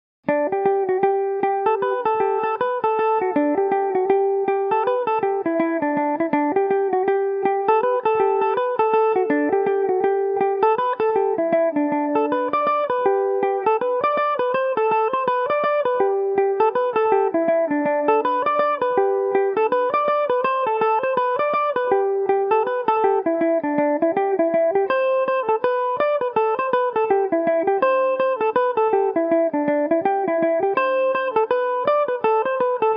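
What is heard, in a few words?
G major revelation, irish mandolin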